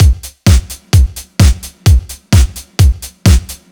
Full Drums, 129bpm, Nu Dance Electro; Zero-G; Soundclash; Combi Loops; Rude Bwoy

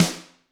GRETSCH SNRR, Drums; ILIO; Double Platinum Drums 1

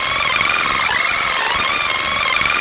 Fast Forward, Machinery; Sonic Foundry (Sony Creative Software); Myths of Technology

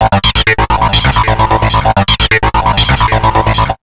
HRD 130 SYN, Big Beat; USB Soundscan vol.25; AKAI; HARD 130

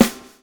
SWNG

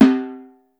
timpani hard, Yamaha Motif ES drum machine; 300 Drum Machines; Yamaha Motif ES-Series

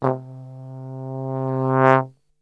C, Brass; Best Service; Brass Super Section

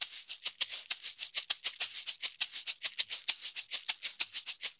Maracas 100 bpm; Drums & Percussion; Best Service; Festa Latina VOL-1

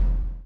CHAIN; Spectrasonics - Backbeat

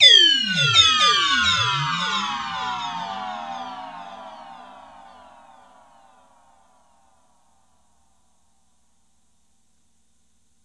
DREAMFILTER, Keys; 3D Sound; 3D Keys VOL-1

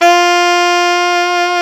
sax alto ff; Alto 414

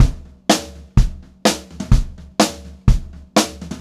126 TOM, Pop-Rock Drumloops; USB Soundscan vol.37; AKAI

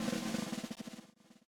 swing

